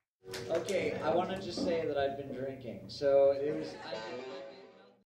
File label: drinking